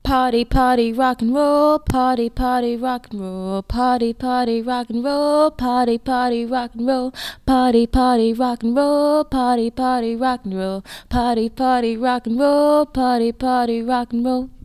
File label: party rock n roll